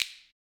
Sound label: Lock